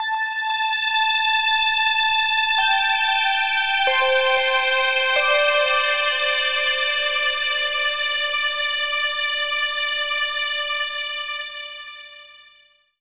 Tinka String; Zero-G Smoke

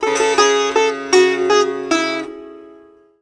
SITAR LINE; SITAR RIFFS2

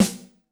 Steel, Snare; Yamaha DTXpress-II

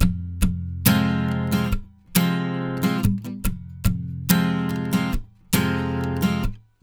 70 MIN CHD F, Acoustic Guitar Loops; USB Soundscan vol.04; AKAI

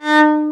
VIOL D#3, VIOLON, Arabian Traditions; USB Soundscan vol.43; AKAI

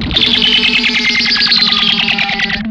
ZAP FX, Funk Guitar; 90 s Sample CDs; Zero G

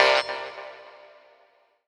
TING FX; Rawcutz - Nu Forms; Gbm

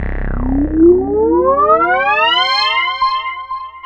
Korg, FX; Back In Time Records; Korg Universe VOL-1; FX FAMILY

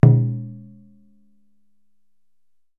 DJUN DJUN, African Perc; E-MU Producer Series Vol. 7 – Old World Instruments

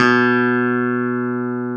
B2, CLAV; Sonic Reality; Vintage Timetraveler; CLAV PIANET

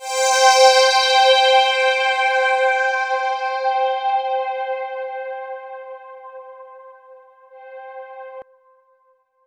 POLY SAW, Roland JV 2080, C4

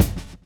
GRV, Groove; AMG Gota Yashiki Groove Activator

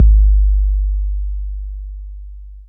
K 808, KICKS TR 9+8

